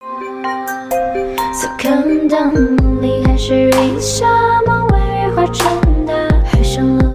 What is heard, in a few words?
Chinese Ringtone